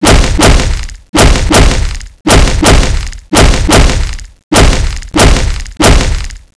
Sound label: attack tentacle; cstrike; dione